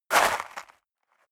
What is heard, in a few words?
step leather shoes gravel light jump; schritte